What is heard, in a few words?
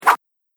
Weapon Air Swish, combat swing; Fantasy Sound Effect